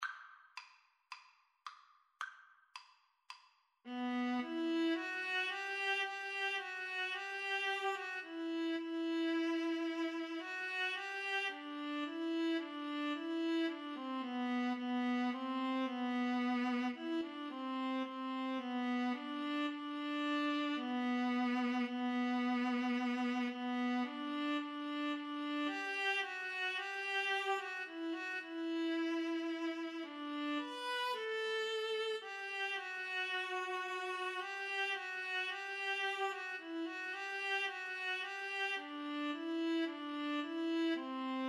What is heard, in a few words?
Violin Viola Duet